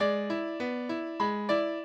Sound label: minuet, mozart